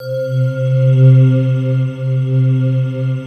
GOSTH C2, D70 SYNTH; East West; Global Sounds

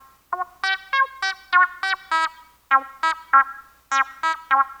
Bleep Synth; Sonic Foundry (Sony Creative Software); Stylus Pressure Urban Grooves on Digital Wax; Bleep Out

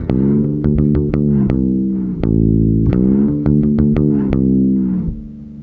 BASS, BASS GTR

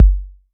BD 808

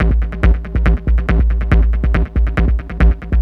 Beats, Industrial

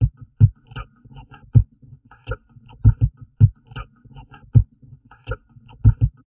TALK, Drum Loops Crazy Processed

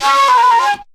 FLU + VOC, FLUTE + VOCAL; Best Service; World Colours

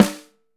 5X14, Drums

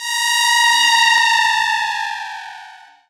fx scifi; Ueberschall; Techno Trance Essentials

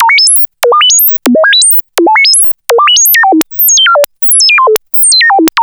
random sine; Zero-G Ambient 1

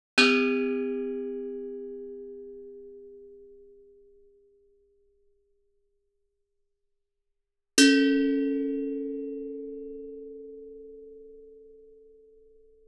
Gamelan; Propeller Island - Complete Gamelan

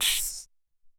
Verb Clap

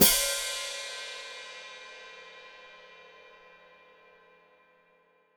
Hat Closed Open, Hi-Hat; Cymbals Stereo; Deeply Sampled Drums

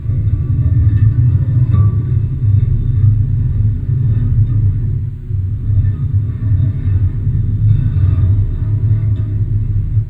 STOVE AMBIEN; ENVIRN-AMB

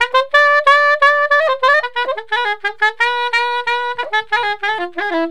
SAX

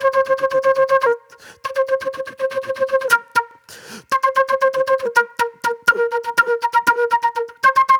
Super Soul Flute; Sonic Foundry (Sony Creative Software); Manhattan Flutes; The Funky Mama

